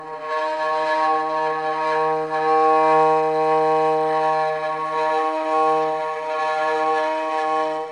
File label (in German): violine